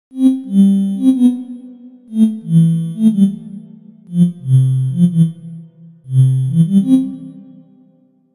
Soft, synthlead

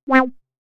Wah, synthlead

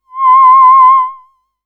Vocalist

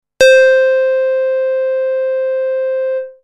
Basic Pulse, synthlead

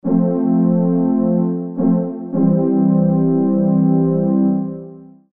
Brass pad chords; instruments

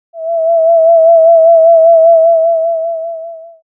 Woman's voice

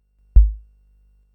Wasp-Drum

